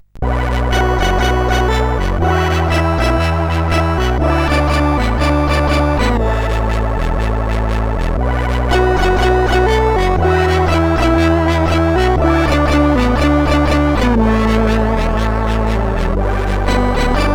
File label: Level completed, CSG8580R5; sid